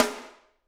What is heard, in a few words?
SD, Drums; Bob Clearmountain Drums I